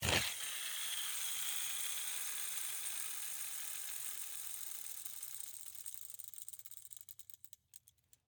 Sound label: Rollerskates, old fashioned, all metal, wooden wheels, swipe, spinning, slow to stop, light; Eiravaein Works; Vaeyan IV